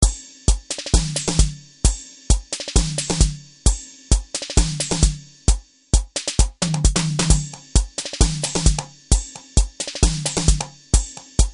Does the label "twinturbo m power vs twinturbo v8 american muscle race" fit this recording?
No